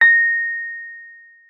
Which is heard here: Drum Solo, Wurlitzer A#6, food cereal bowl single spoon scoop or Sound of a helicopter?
Wurlitzer A#6